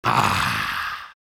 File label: Taunt coffee ahh; soldier